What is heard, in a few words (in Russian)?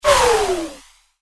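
дальний лазерный рикошет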